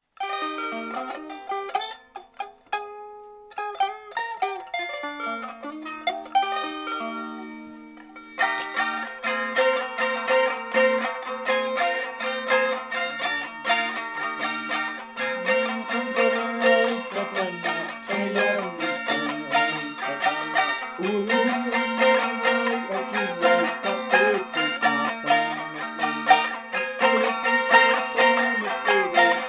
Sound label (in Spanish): aymara canto floreo relincho macho